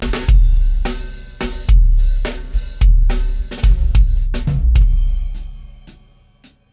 Kick MC77 12to1; RXLoud

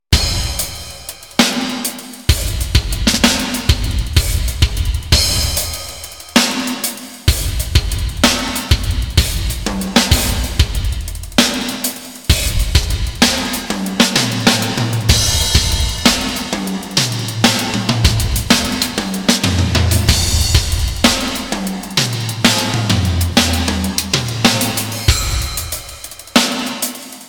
verb test Dance Hall

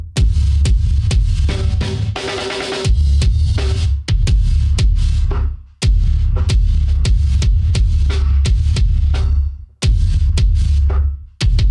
Rack Rev A

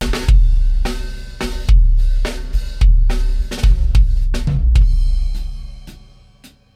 Kick Rev; RXLoud

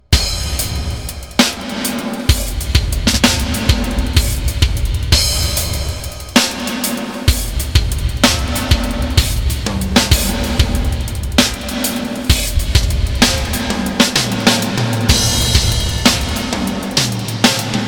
verb test X Hanger

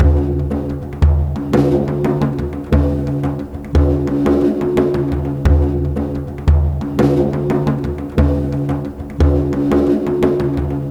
bodhrán 88 bpm

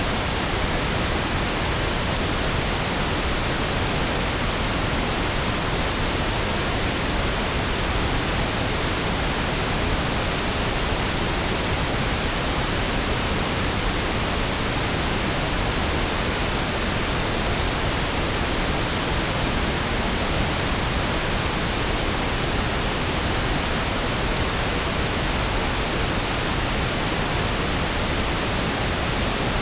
Pink filtered

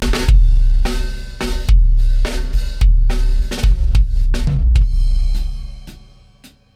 Kick MC77; RXLoud

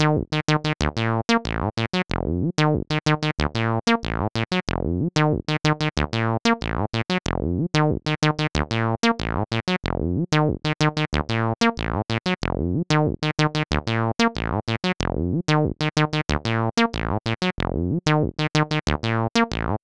SAW

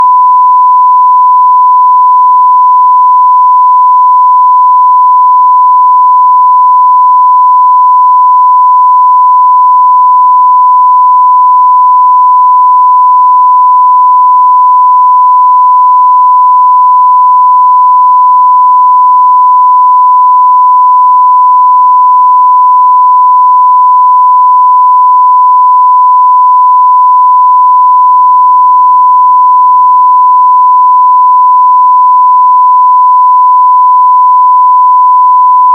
1k Tone 0DBFS

